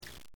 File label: shak beer